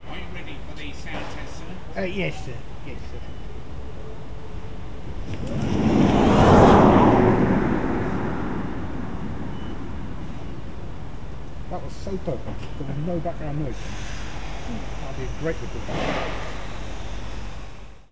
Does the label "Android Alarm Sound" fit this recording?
No